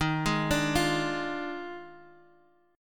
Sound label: D sharp Major 7th Suspended 2nd Suspended 4th; arpeggiated; x,x,1,1,3,1; JGuitar